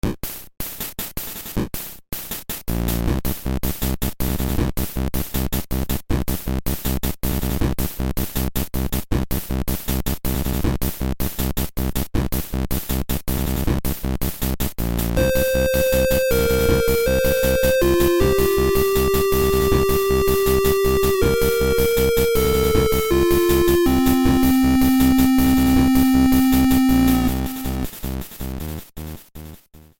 Rockface Rumble; DKL3

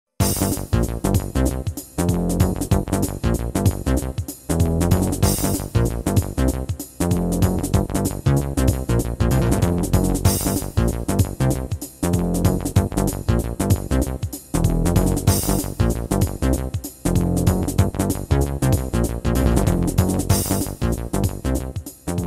Battle Room

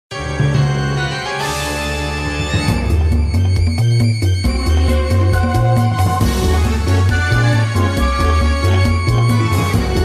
High Noon

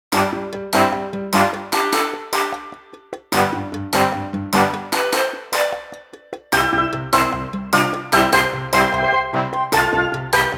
Yoshi Craft Rhythm; M&LPJ

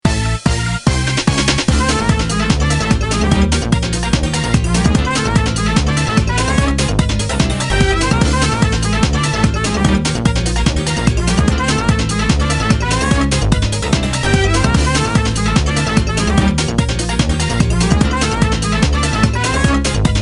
Hurry Up!

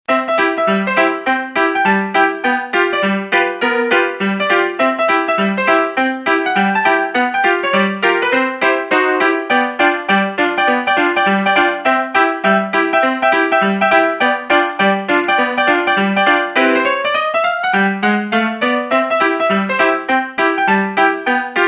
Question Music

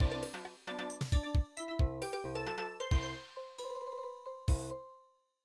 Win; Floor 2-Plus

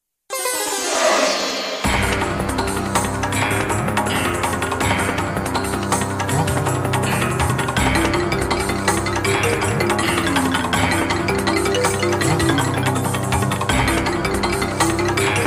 Music Grumble Volcano

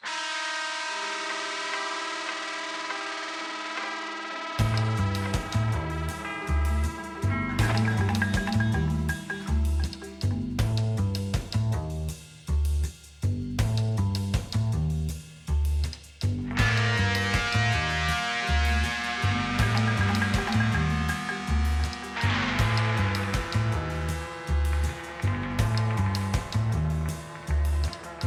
Enemy Enters; PMTOK; Western Showdown

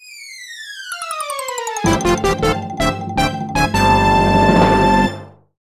opening jingle; NSMBW

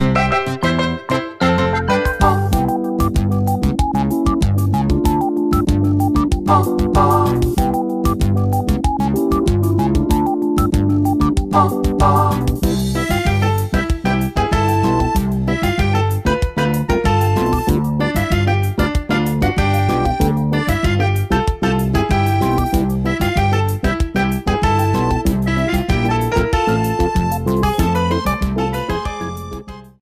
Grass Overworld; NSMBW; Coin World

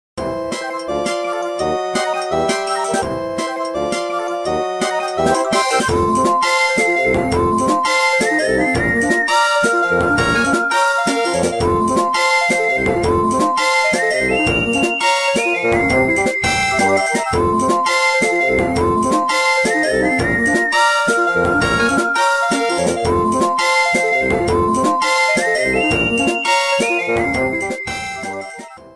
Music Slidebrella

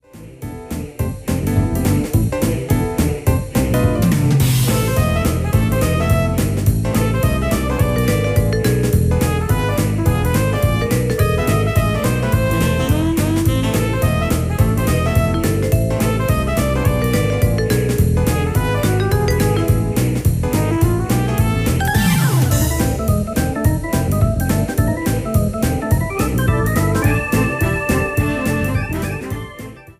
Palm Tree Groove; DKCR; Smokey Peak

